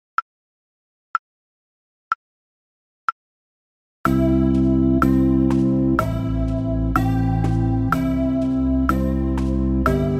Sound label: melody slow tempo